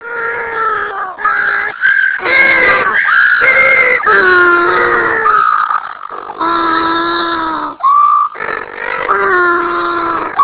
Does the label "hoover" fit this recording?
No